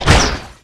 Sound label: Spit Big Wet Launch; Weapons